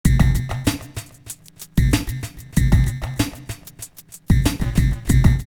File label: Dub Track, drumloops, OldSchool; X-Static Goldmine